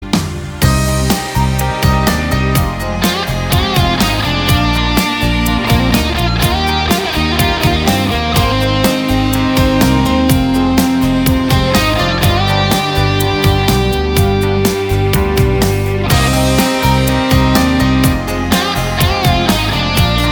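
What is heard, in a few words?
instrumental melody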